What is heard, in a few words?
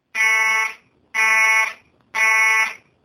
school fire alarm